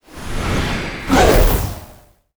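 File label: soul seeker attack, monster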